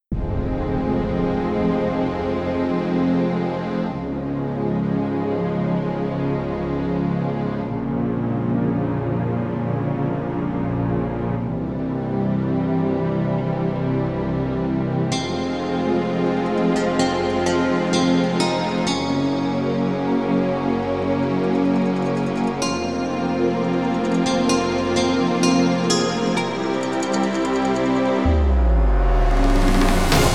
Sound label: orchestral